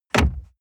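sports car interior glove compartment close medium; verkehr-transport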